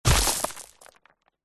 impacts object heavy thud dirt stones debris flying